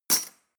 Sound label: cutlery teaspoon drawer place hard